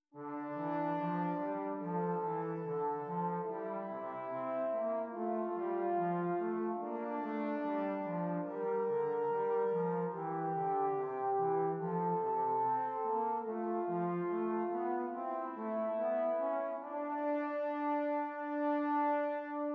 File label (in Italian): Esercizi di contrappunto a due voci; Dritte Gattung; Nottebohm pagina 49